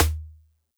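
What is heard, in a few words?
Xylo Snare DX100 D sharp 1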